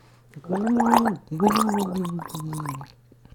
Drowning

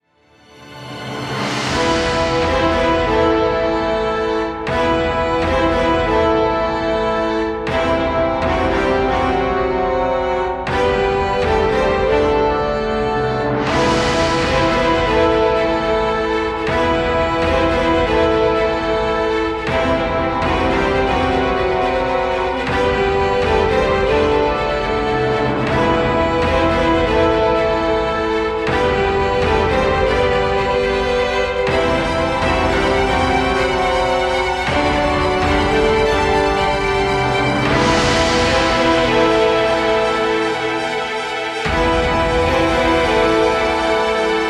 Epic Fantasy

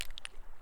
Rocks

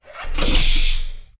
Pneumatic Airlock Door Short

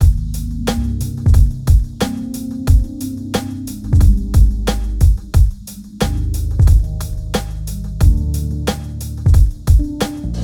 Piano Drums 90 Bpm; Timestep

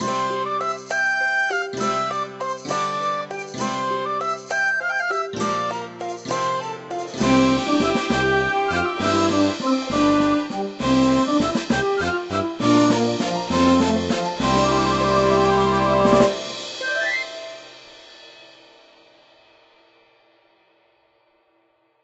Short Military Track Medieval

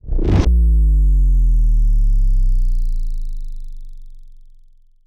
Subdrop Transition

